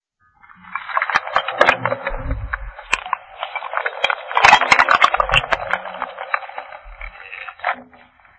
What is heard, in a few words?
broken clickscrape stuff; Atmospherics